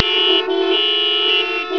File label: klaxon